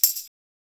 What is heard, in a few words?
Tamb; Soundscan vol.56; Modern Percussion Loops; AKAI